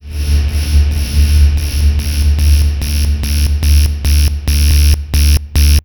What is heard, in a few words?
Approach